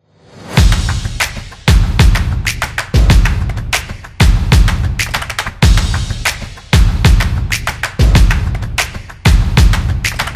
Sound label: Drumming; sports